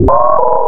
INST FX, Ragga & Dub Reggae

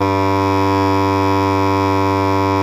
BASS SHAWM; SoundBytes - Early Patches

